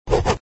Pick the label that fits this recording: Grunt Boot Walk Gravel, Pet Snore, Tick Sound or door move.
Tick Sound